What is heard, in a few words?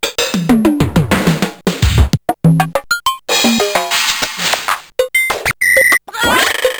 drums; A programmable drum machine triggered by touch pads.